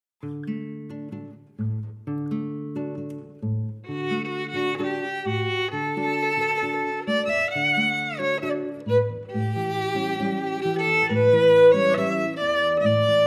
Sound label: Violin & Guitar